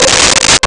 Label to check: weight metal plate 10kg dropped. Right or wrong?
Wrong